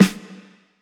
snare, Acoustic

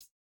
no effect j city pop 1980 hihat

